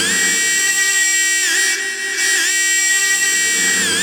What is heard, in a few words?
tritone, distortorama; Spectrasonic Distorted Reality 1